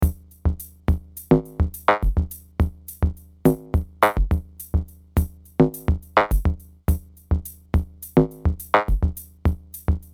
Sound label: Sea Urchin (Instrumental)